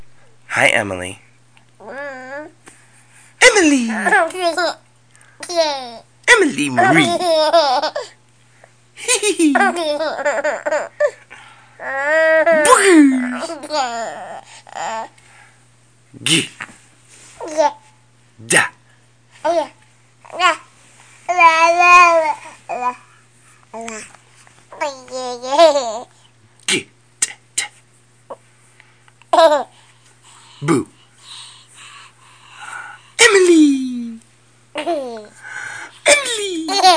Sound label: baby noises and giggling